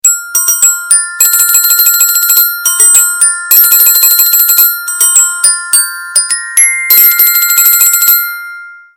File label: So Sweet Ring